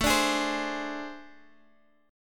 A Minor Major 7th Double Flat 5th Guitar Standard; AmM7bb5 strummed; 17,17,18,17,x,x

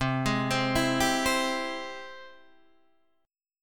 G sharp Augmented Major 7th

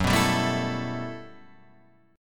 G Major 13th

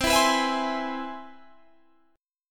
C Augmented Major 7th, Guitar, Open G

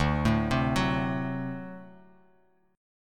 D 7th, down; D7 arpeggiated; JGuitar

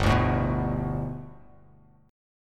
G Major Add 9th, Bass Standard; strummed

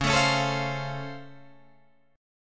Db Augmented Major 7th, down, Guitar, Drop D

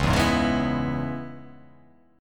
Db Minor 7th Db down, Dbm7 chord; strummed; JGuitar

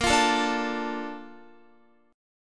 Bb Major 7th, Bb, down, Guitar; strummed

